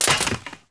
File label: droid fall